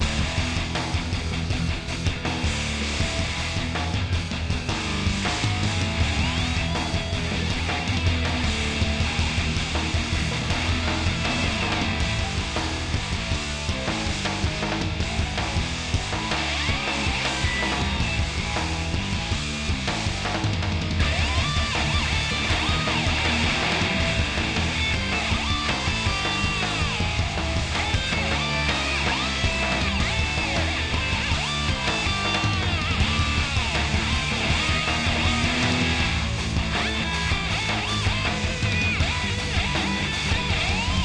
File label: Battle Loop